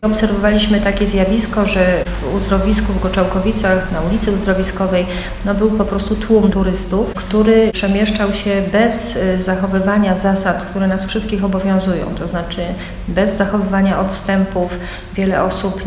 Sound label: bandola